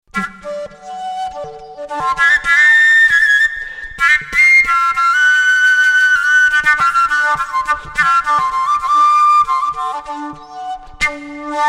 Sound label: Kalyuka D